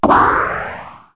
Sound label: spacey, cartoon twangs